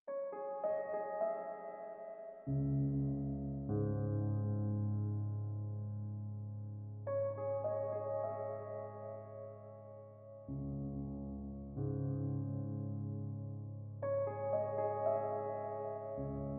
Music SuspensefulBack; Lucius III

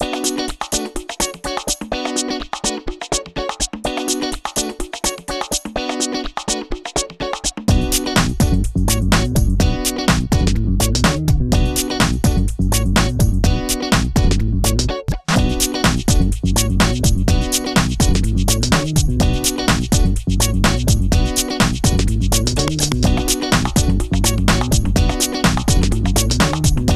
Fast Funk; Phineas and Ferb: Quest for Cool Stuff

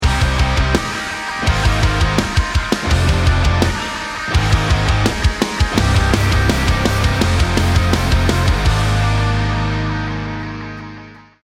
start action

